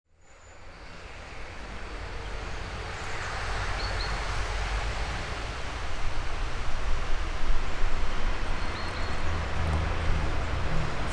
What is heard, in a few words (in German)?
Tram Wolfsschlucht